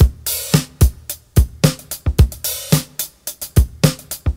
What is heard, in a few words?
greased, Drum loops Boombap